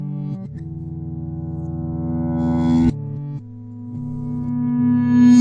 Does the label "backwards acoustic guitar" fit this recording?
Yes